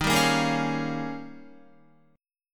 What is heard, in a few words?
Eb 11th Eb, down, Guitar, 4F,4A,5C,5G,5C,6E; strummed; JGuitar